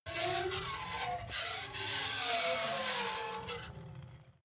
metal screech phaser edgy

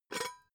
pot lid take off soft; objekte; topf

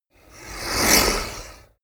whoosh speaker harsh

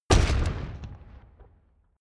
metal spike

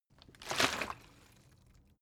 bottle water sparse upend; berufe

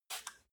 spray bottle single; haus garten

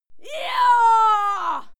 female fight scream; schreie; menschen

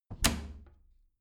bathroom cabinet doors close heavy; objekte; tueren

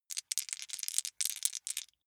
small three rattle in hand, wuerfel

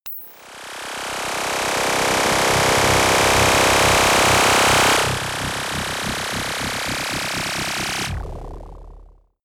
splutter noise long, sciene fiction

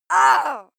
female fight shout pain; schreie; menschen

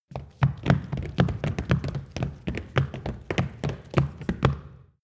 step group small run stop wood

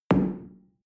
shell place on tablecloth hard; berufe; gastronomie; soundpool

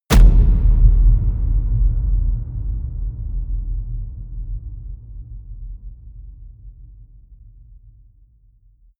war stomp; whooshes rises

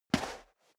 step boots sand single left

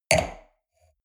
wine glass place table medium; soundpool

